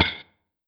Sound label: Pandeiro